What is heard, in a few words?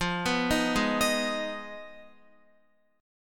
F Minor 7th Flat 5th, Guitar; Listen to Fm7b5 arpeggiated